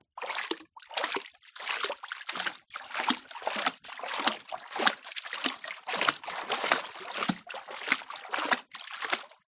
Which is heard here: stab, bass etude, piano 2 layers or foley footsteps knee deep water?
foley footsteps knee deep water